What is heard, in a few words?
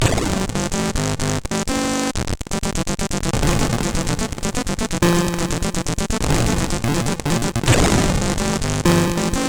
Intro sid CSG8580R5